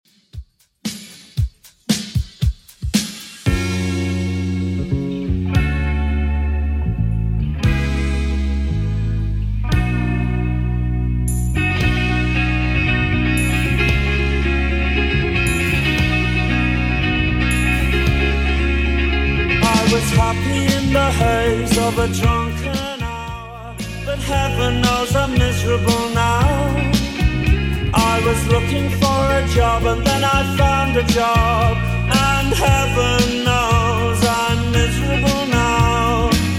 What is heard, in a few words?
80s Redrum 115 bpm CLEAN